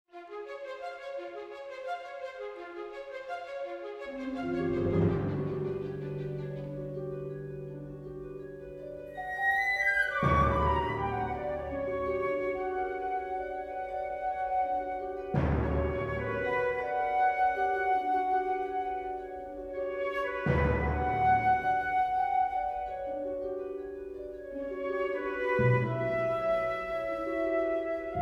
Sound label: World Music; Jurassic World Evolution 2; PS4; Windows; Xbox One; Xbox Series X/S